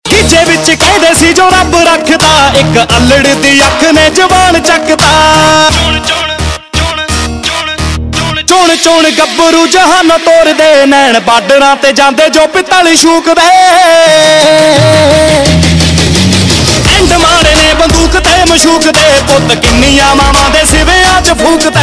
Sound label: Ringtone, Punjabi